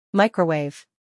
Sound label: microwave